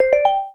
決定 3; LcdDem SFX

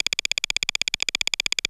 katakata; LcdDem SFX

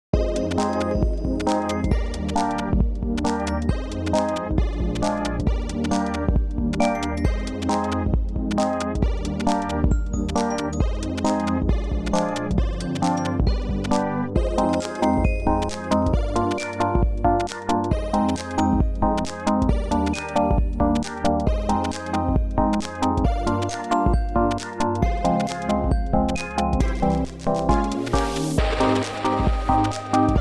ポーズ; Tidal Hopper

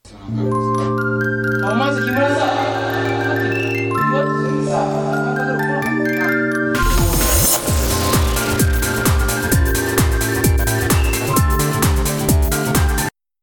太いサウンドと効果的なリバーブ; ニコニコ動画

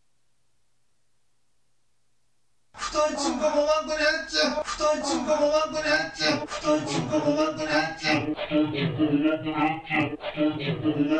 太いサウンドと効果的なリバーブ; ニコニコ動画

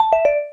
キャンセル; LcdDem SFX

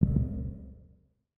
足音; LcdDem SFX

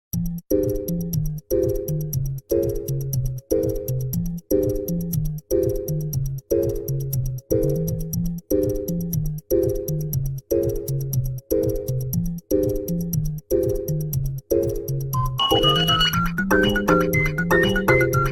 リグレーの鳴き声だけで曲作った; ニコニコ動画

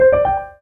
決定 2; LcdDem SFX

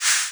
足音; LcdDem SFX

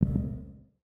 足音; LcdDem SFX

